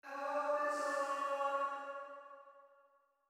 Chime; chime sharp

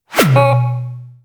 Boss Hit

